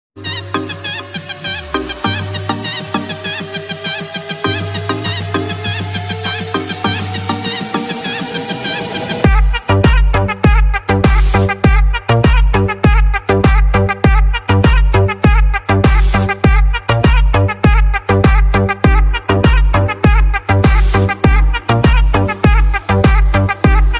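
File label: new english ringtone trending ringtone bgm tune bgm ringtone attitude ringtone for boys songs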